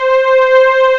MOOG STRN, Moog Synths; E-MU Formula 4000 Series Vol. 3 – Analog Odyssey

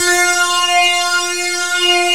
ANALOG GIANT; OMI - Universe of Sounds

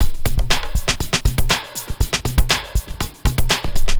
Drama Drums (120BPM); cinematic drama; Loops; musicradar

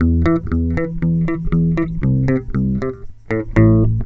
CLAV BASSE; USB Soundscan vol.32; Roots Disco; AKAI

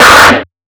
Snares, Alesis Performance Pad

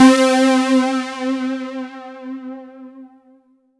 C5, Synth Line; 90 s Sample CDs; NiceBeats; SynthLine VOL-23

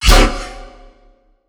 BASS SHOT WOOF

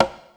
PC, Casio CTK-5000

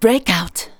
vox, Techno Trance; Ueberschall

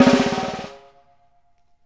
Snare Tickle; Essential Percussion

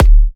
D, Kicks; markus hakala

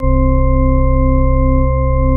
CH ORGN C2; East West; Global Sounds; ORGANS

